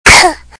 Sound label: cough